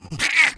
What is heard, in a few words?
rage at victim, female; npc, infected, action, rageat